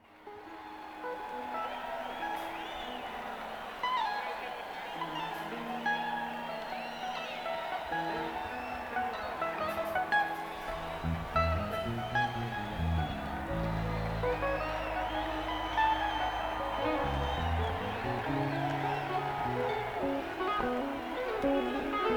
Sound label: Tuning